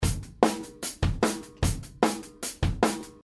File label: Roland S-330 Sampler Drumloop Original